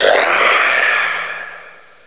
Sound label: death; skullwiz